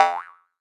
stomp; PlaydateSDK; Level 1-1

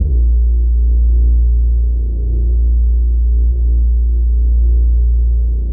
Bass Thick A#0

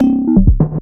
FX Glitch Chop; FX Hit; Drums; Ableton Live 12 Lite; Core Library

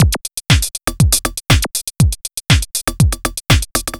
ELECTRO SET; Sony; ACID Pro 6.0

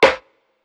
Rim, Drums

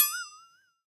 Flexitone; Drums; Misc Percussion

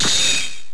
Custom full; MMBNMMOP

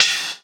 Splash, Cymbal; One Shots; Drums; Grounded; Ableton Live 12 Lite; Core Library

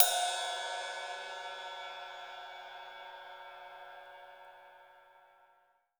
Ride Ping; Drums

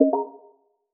Message; Microsoft Gaming App

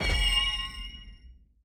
skill learn end, menu

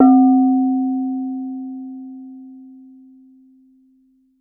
Ceramic Tile C3, Mallets

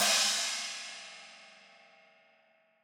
Crash China Stick Hit, Cymbal, Drums; One Shots; Cop Style; Ableton Live 12 Lite; Core Library

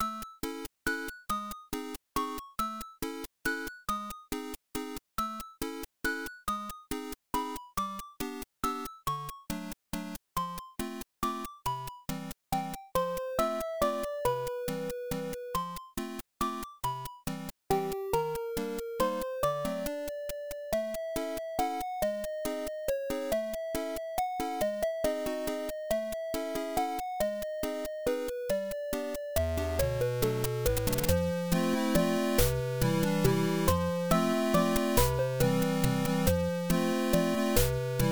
Orchestrated 8-Bit

